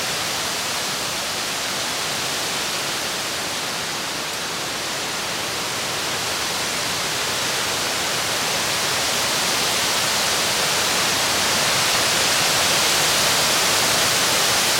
vento e foglie; Inquisitor 3

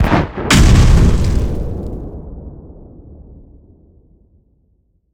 Cannonata; Elyon

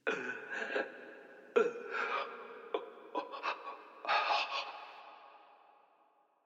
lamento uomo; Inquisitor 2

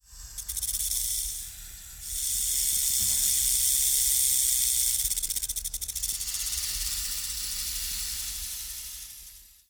serpente; Inquisitor 3

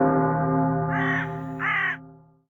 Cimitero; BLIND QUEST - The Enchanted Castle

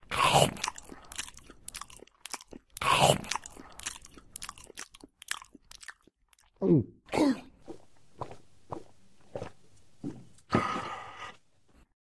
morsi; Inquisitor 2

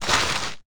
Morso

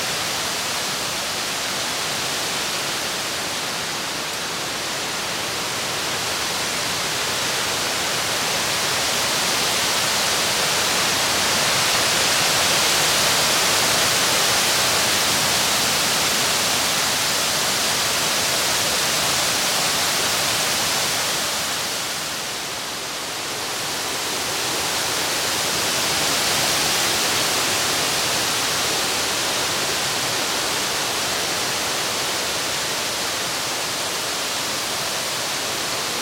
vento e foglie; Inquisitor 2

